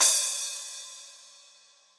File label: Splash 12 Cold Tight Room, Cymbal; Drum Hits; Standard Hits; Ableton; Factory Packs; Session Drums Studio